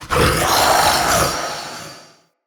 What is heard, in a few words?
Male Vocal Attack, Enemy; Mockery; Mockeries